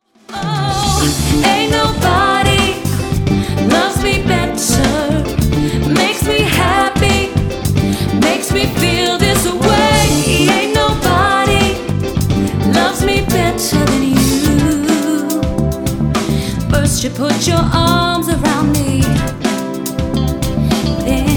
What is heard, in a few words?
Melodica Band